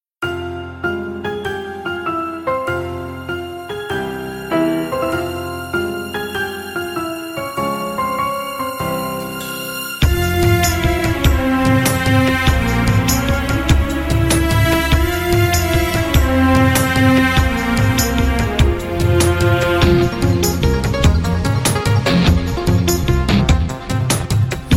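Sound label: background music ringtone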